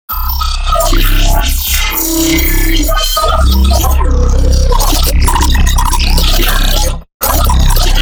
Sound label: codec stuff, bass